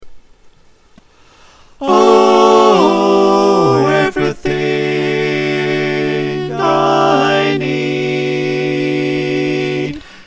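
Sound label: soprano alto tenor bass, choir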